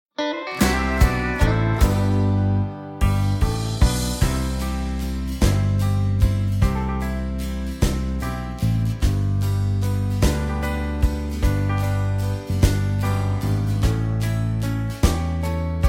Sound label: (G) No Backing Vocals